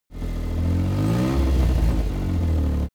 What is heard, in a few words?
car buggy rev up